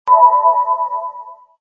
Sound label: load